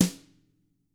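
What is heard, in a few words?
TAMA brass snare, Drums; ILIO; Double Platinum Drums 1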